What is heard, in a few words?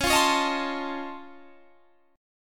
Db Augmented Major 9th, down; Db+M9; strummed